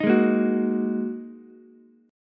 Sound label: Ab 7th Suspended 2nd down Ukulele; Ab7sus2 strummed